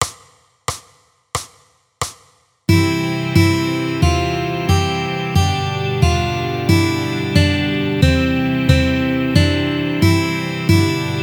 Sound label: ODE TO JOY SLOW